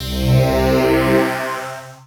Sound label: PAD